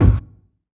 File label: wet kick single shot F sharp key